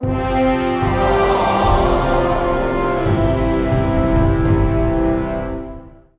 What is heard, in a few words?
Race Lose; pony friends 2